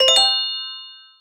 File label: bells upward, rewards